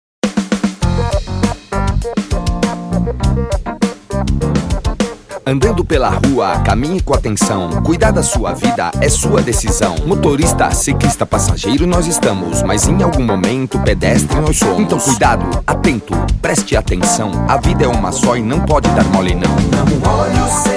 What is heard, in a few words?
JINGLE